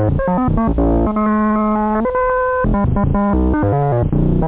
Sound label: slow